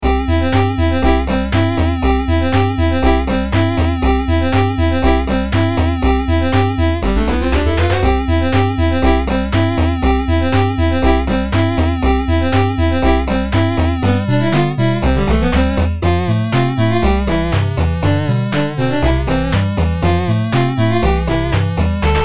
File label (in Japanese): ファミコン loop 120